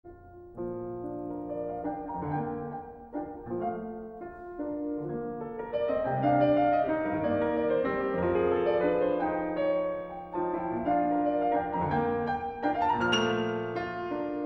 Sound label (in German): Variationen b moll